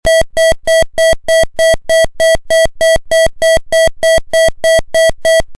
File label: tone